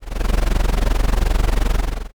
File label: cat purr